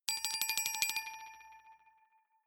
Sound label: glockenspiel sparkle tremolo low short